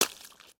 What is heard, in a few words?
Foot Swamp Water Run, footsteps